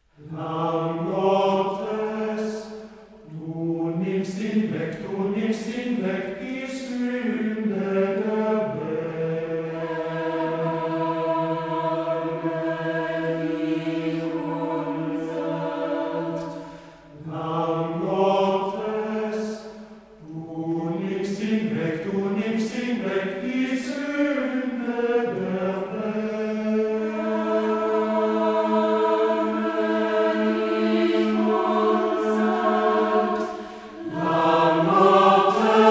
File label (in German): VOX HUMANA; Lamm Gottes